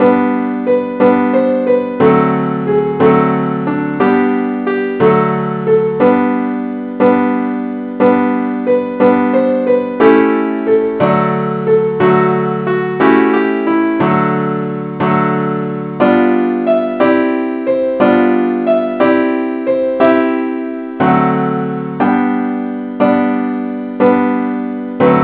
simple acc key g; angelvoices

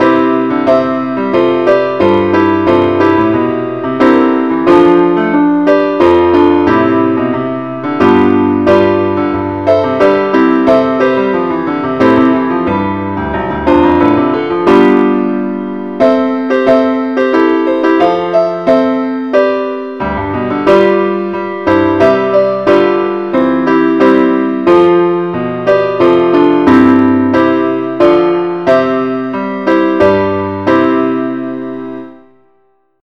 ineedthee keyboard only key c

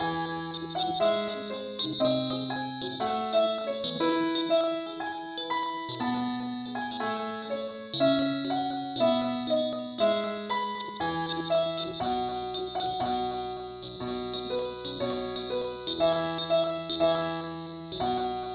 mandolin key e

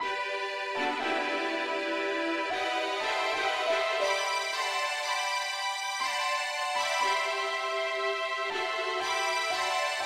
pop uke lead key g

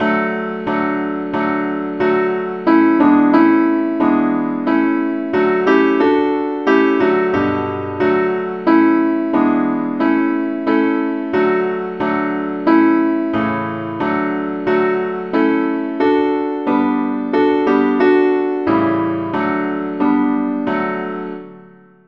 simple acc, key D

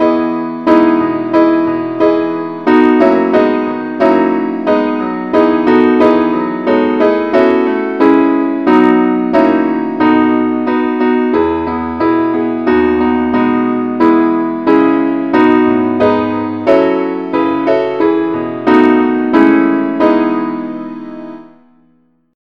keyboard key e; saintthomas

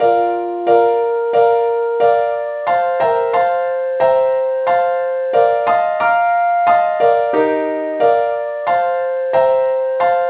leadsheet key bes; SAINTTHOMAS

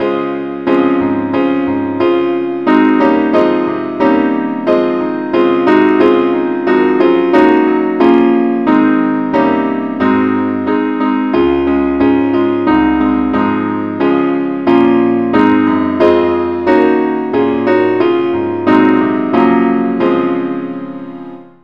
keyboard key des; saintthomas

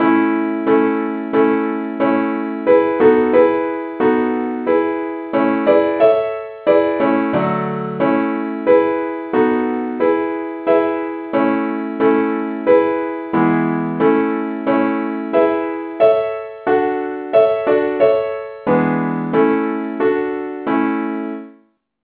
saint thomas simple acc key a

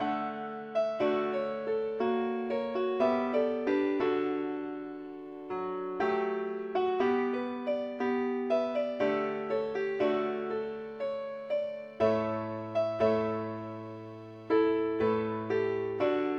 keyboard only, key d; pilot

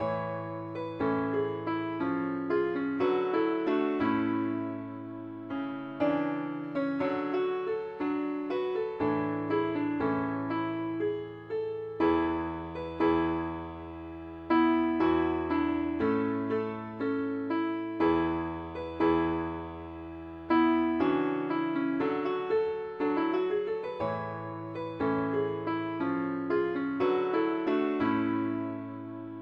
keyboard only, key a; pilot

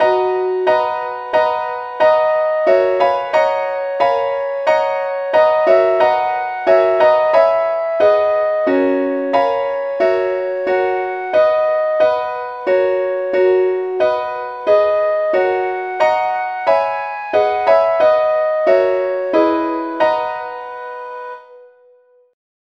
advanced lead key b; SAINTTHOMAS

